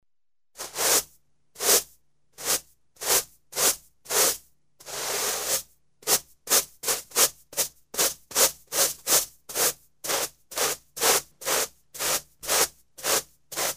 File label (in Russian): zvuk podmetanija schetkoj